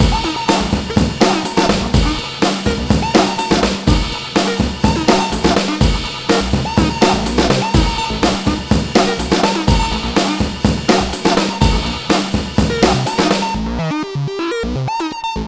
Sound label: TRAILER BREAK 124 bpm; POTBreaks